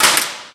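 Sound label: physics clipboard impact medium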